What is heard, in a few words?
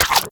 Infested Punch Life Steal; Kick Punch; Weapons